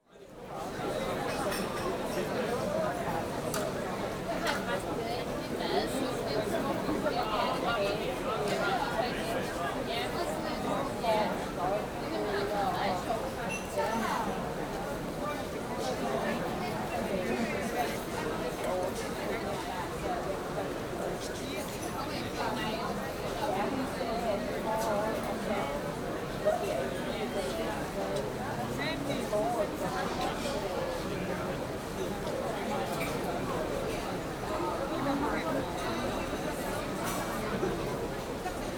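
Piazza Italy, Exterior Atmosphere Ambience With People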